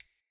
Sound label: UI Default Theme Type